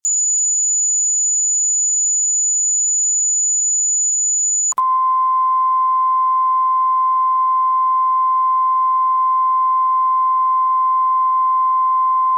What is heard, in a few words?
Sound Effects; Pinocchio; Tokyo Jidougekijo